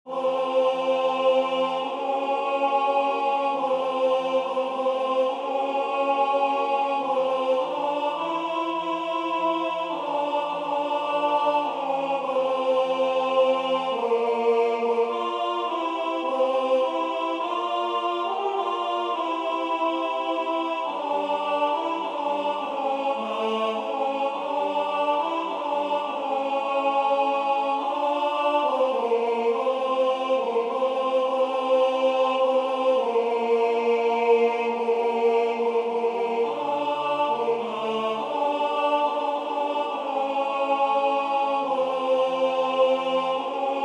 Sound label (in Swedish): TENOR; Jul, jul, strålande jul